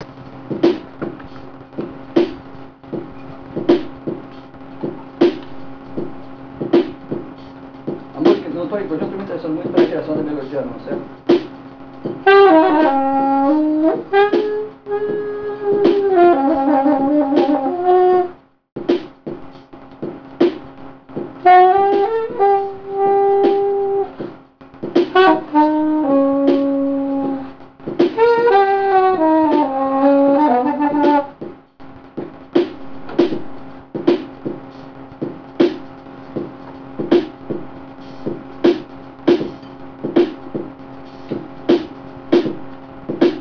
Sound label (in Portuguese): batida leve